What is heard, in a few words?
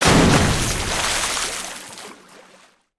water splash close; destructibles